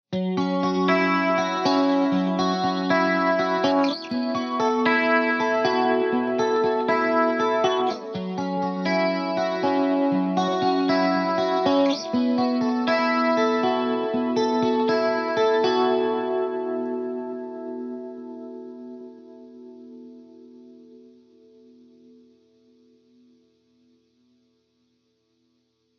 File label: Clean Guitar Loop